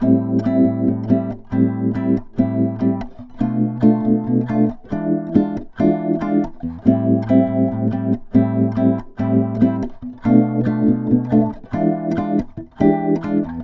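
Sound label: electric guitar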